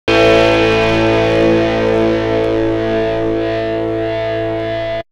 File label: Pluck; AMG